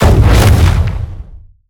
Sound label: Mortar Cannon Fire; Evolve; Behemoth